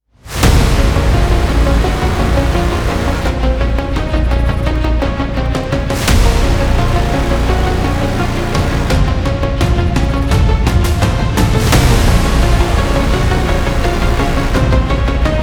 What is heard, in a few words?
Danger, tense